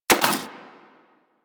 Rifle Mech Alt; Weapons; Warframe; Thanotech

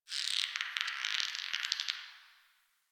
Magnet Chatter, Ambience

